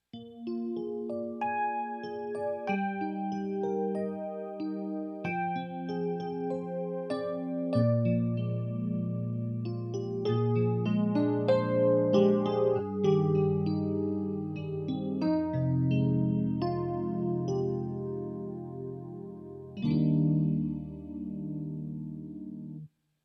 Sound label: Kawai MP11 Modern EPiano